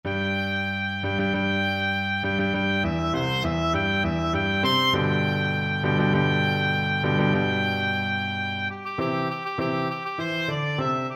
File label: rossini william tell, oboe